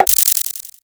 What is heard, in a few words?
Boss Attack